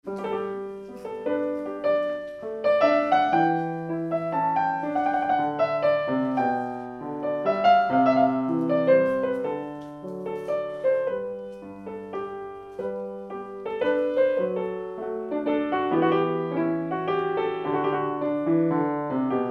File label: ground in gamut